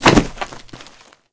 Hit Ledge; Foley; Warframe; Characters; Tenno

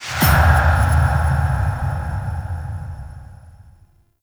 Choice Menu Open; Warframe; The New War; Table For Two

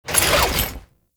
Body Roll, Foley; Warframe; Duviri; Dax

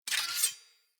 Secondary Dagger Equip, Swords; Weapons; Player

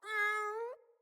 Kitten Meow Playful; Enemies; Catbrow

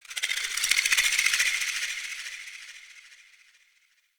Snake Rattle; Ambience; RedNight

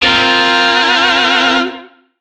Shawzin Pent Min Chord; Warframe; Lizzie Shawzin; Chords

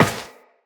Step Metal, Footsteps, Walk; Tenno

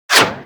Bullet Parry Metal; Warframe

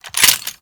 Sniper Rifle Reload; Warframe; Grineer; Gorg

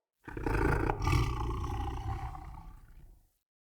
Battle Dog Snore; Animals; Vocals; Snc Battle Dog

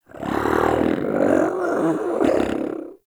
Combat Vocal Idle; Warframe; Catbrow

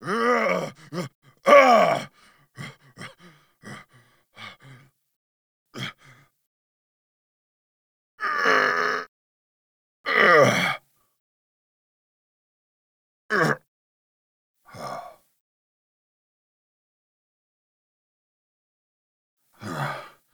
Breaths; Cinematics; Grineer Pod Check; Kahl

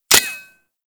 Pistol Mech; Warframe; Tenno; Guandao

